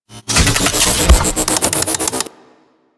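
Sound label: Stomp Ability; Warframe; Corpus; Ambulas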